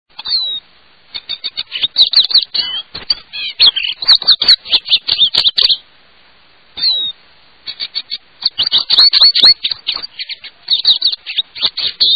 Lesser Goldfinch; Birds

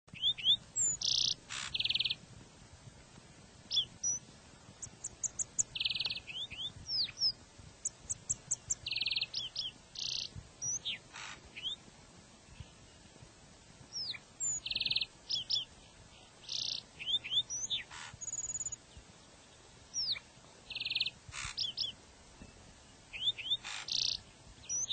Lark Sparrow; Birds

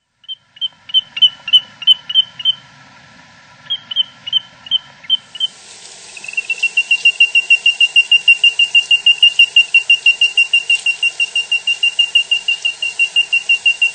Wandering Tattler; Birds

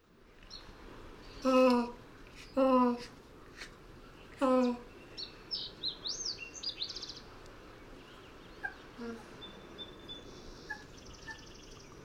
Pelagic Cormorant, Birds

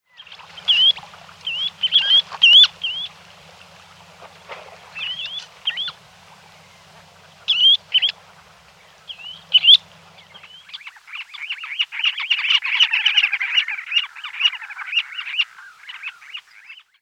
Semipalmated Plover; Birds

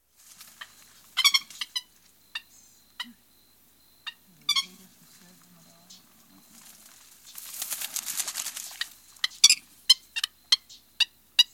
Purple Gallinule; Birds

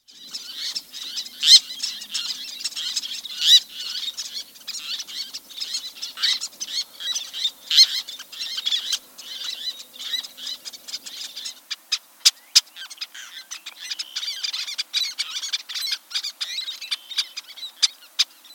Least Tern; Birds

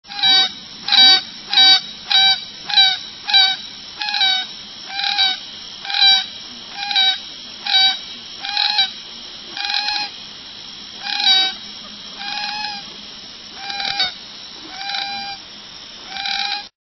Common Moorhen, Birds

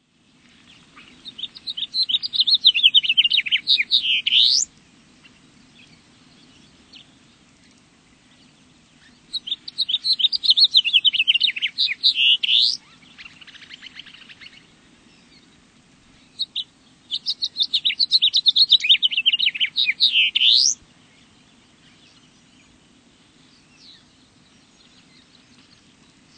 House Finch; Birds

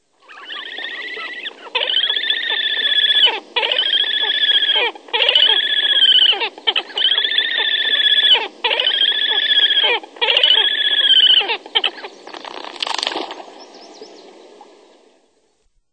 Horned Grebe; Birds

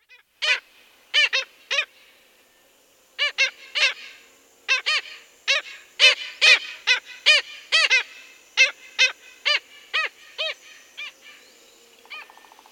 Black Skimmer; Birds

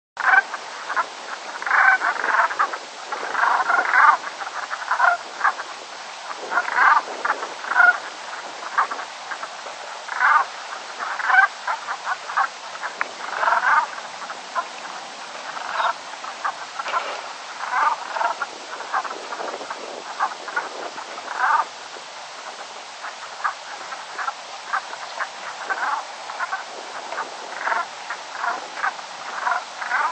Brant; Birds

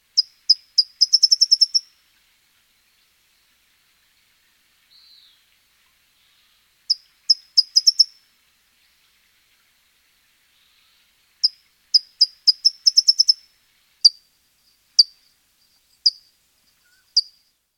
California Towhee; Birds

